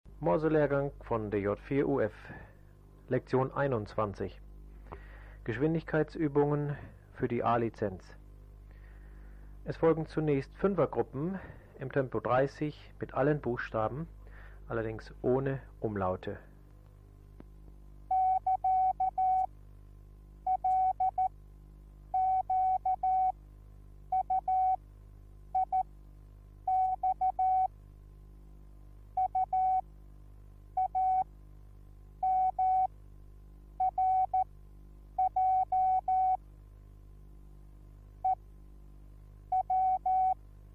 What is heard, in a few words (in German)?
Morsen; Onlinelehrgang